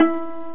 pluck, net instrument; Internet 1996 World Exposition; Brain Opera; net-music

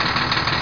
truck idle; SPECOPS

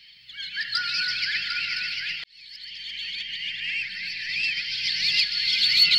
AMBNT 160; Ambient

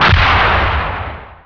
PANZER; Hidden & Dangerous; h&d demo

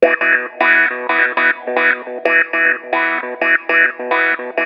Guit; FUNKACID1

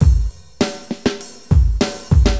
Drums, AcidJazz Funk

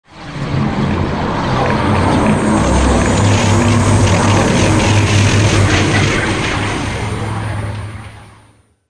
Terran Small Energy Open; Earth and Beyond; EA Games

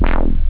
electro, synth bass

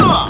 AOUCH; Red Wolf

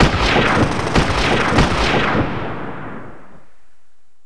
weapon

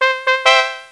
win; Stratego

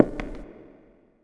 foot glass; Earth and Beyond; EA Games

